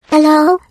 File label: taunt; taunts; stompinggrounds; props